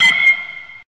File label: bat hit; freak fortress 2